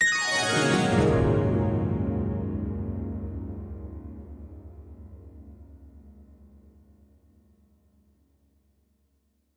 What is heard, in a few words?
deep harpsichord rundown blacks; Piano and Epiano